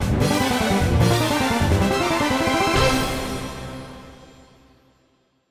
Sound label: Area 1 Clear; Bomberman 1 & 2